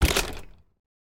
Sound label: treasure chest